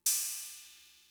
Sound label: Cymbal, Fricke MFB-522, Drum Machines